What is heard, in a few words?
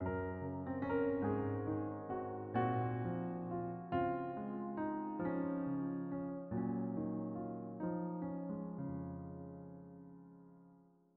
piano, march snow sound